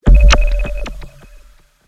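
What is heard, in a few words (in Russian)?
эффект звукового импульса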